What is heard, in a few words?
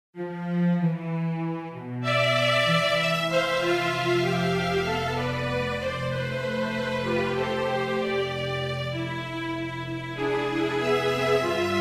String Orch Interlude